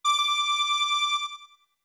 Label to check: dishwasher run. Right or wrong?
Wrong